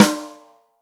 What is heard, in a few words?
rock snare chromatic, real drums; Best Service ProSamples vol.43 - Real Drum Kits